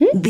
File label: voice fun small character emote surprised